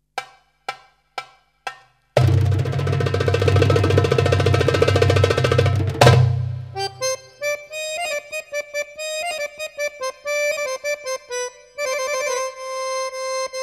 Solo Akkordeon

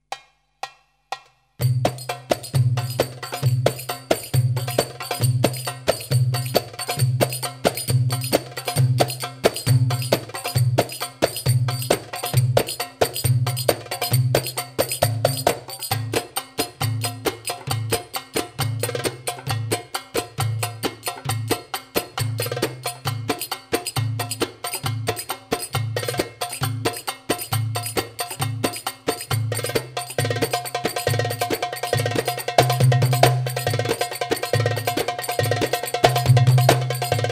Solo Tabla

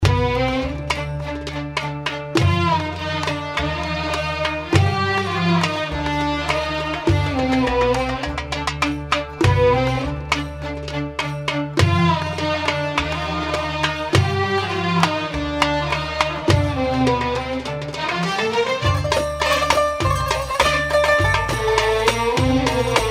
Instrumental

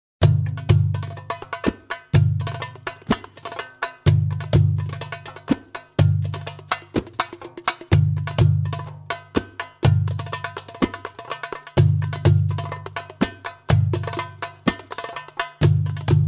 Masmoudi, Drums for Training

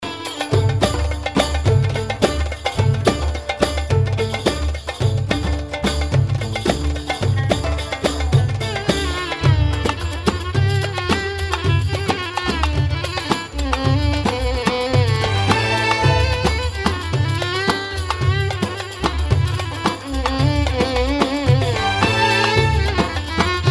instrumental

